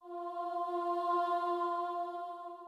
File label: starting note, voice; triumphant victor